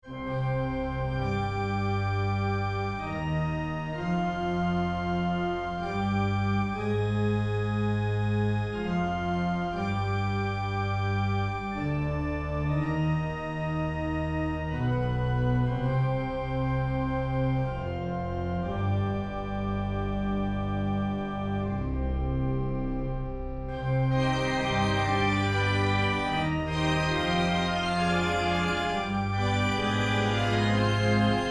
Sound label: Sync Wavetable Soni